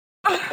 grunt

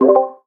unmute sound; discord

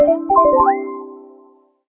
outro jingle; leapster explorer

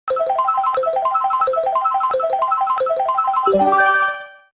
item roulette

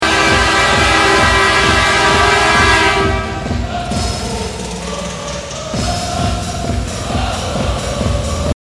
goal horn; oilers

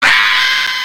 pig scream; angry birds; pigs out

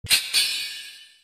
chaka drum

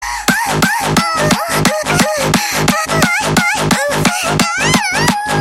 sus anime song

